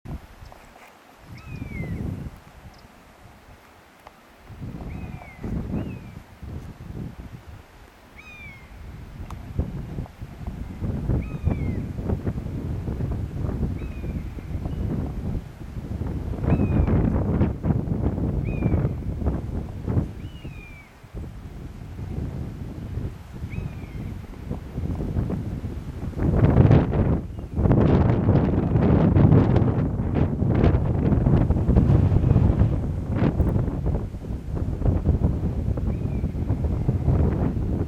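honey buzzard, common buzzard adult call, juvenile honey buzzard call; slaley forest trygill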